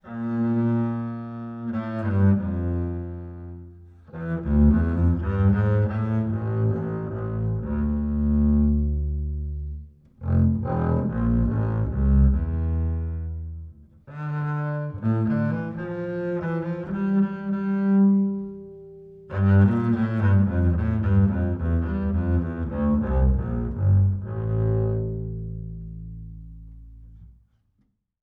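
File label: acoustic bass Bowed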